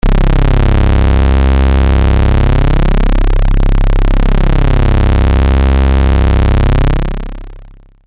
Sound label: bass with LFO; neptune